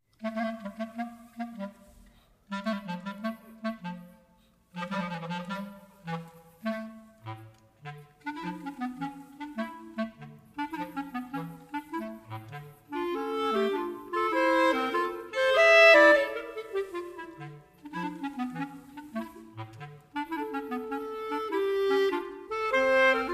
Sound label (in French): Marche funebre